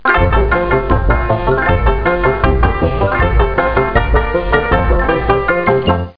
music; How Many Bugs in a Box

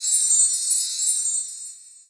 heal; allods2d

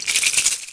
toggle; shadman

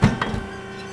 ship tail close; Earth and Beyond; EA Games

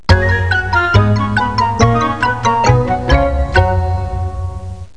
Cute Theme; Mookie

